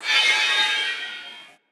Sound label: anime laser; SoundsCrate